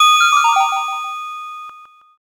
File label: ice magic